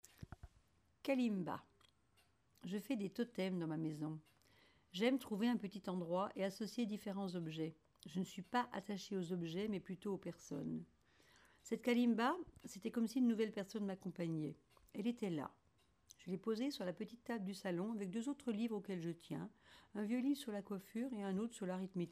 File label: kalimba